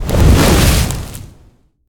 jungle appear; silverback

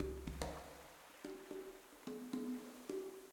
Free Spin Outro Loop; aloha

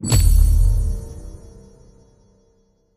pot Lamp; mercy of the gods

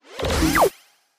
tv Screen In; spacewars

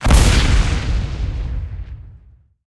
crab Cannon Ball Land; reef raider

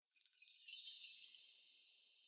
Chimes; knight rider

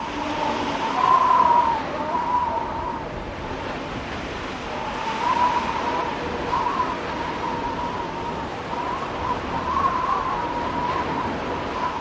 wind Loop; shangrila

